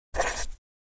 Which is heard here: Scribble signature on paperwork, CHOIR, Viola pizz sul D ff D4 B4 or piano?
Scribble signature on paperwork